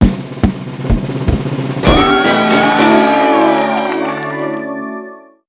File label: LOTTO VERY GOOD